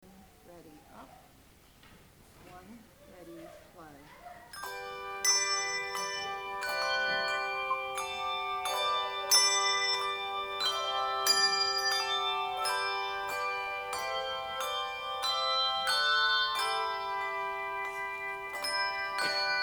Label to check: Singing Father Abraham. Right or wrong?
Wrong